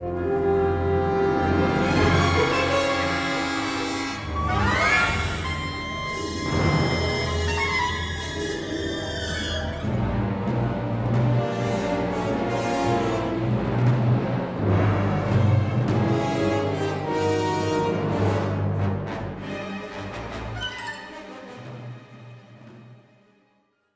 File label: tension, music